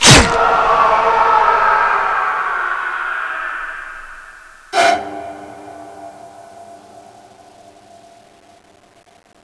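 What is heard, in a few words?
katana hit body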